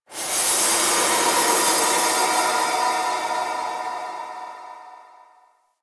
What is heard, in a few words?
Moon Texture Small, Ambience; Warframe; Orokin